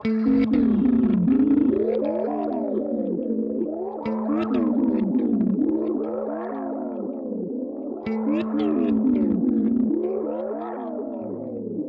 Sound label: Modulation Frequency Triangle; Red Panda Raster V2